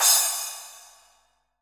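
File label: SPLASH, drum machines; JV-1080 DRMS; EdgeSounds; Drum Mashines VOL-1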